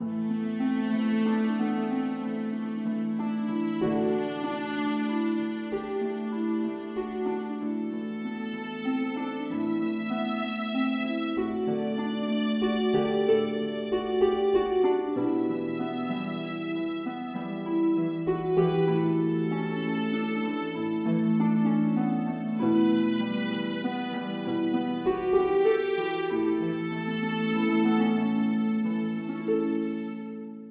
UI GameScreen StatsTrack; Samantha Swift and the Hidden Roses of Athena

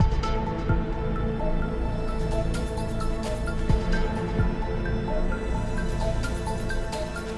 Mood Tension Light

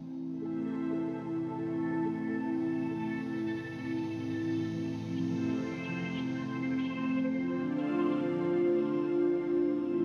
Space Ambience; Landinar Into the Void

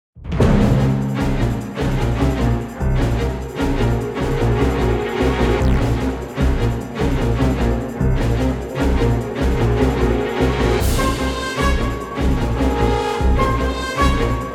Combat Music 150BPM; ps4; xbox series xs

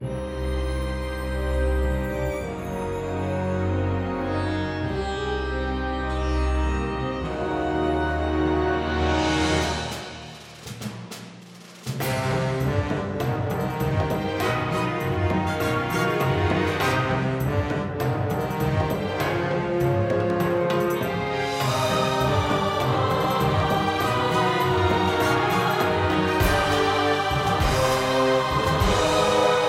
Forest BGM; hero of sparta; android

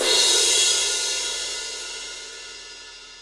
Crash

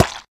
cake drop, Cake Plop; Spanish; Birthday Party Bash; wii

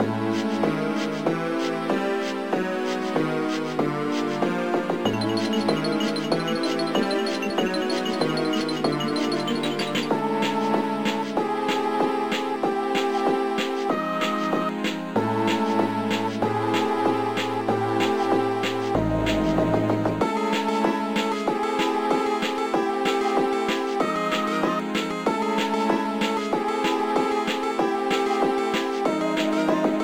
Boss Battle; Mr. Nutz; gameboy advance

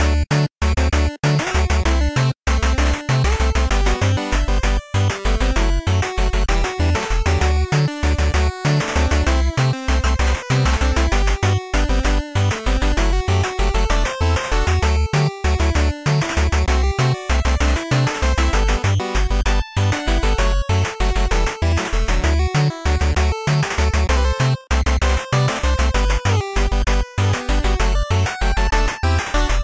Level Map Mono; Sigi A Fart for Melusina

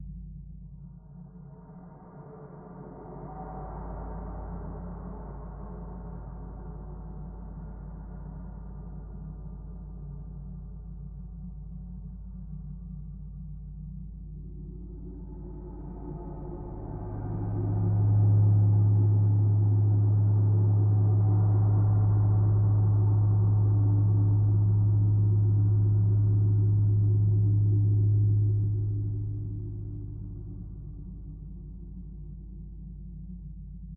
snow ruins ambient; Hellsplit Arena

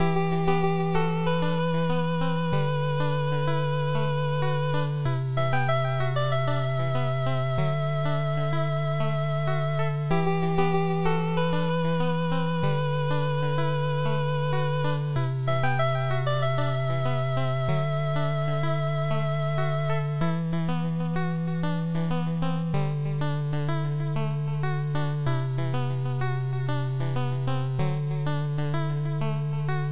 Tension; Yamada Tension; Dandy Dungeon Legend of Brave Yamada

